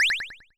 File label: menu confirm